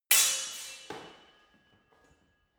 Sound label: sword hit sword single